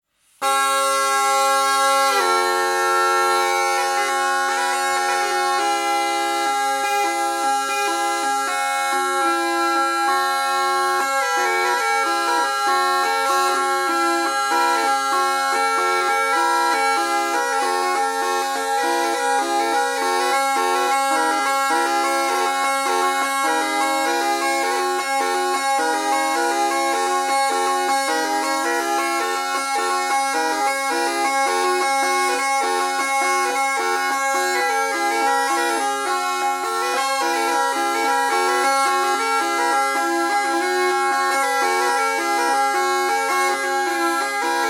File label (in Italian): Tarantella; Tarantella per mio padre